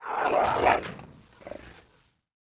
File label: WARN; mahlendur